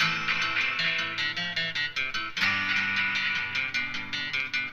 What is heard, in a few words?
guitar, Loops, Phonky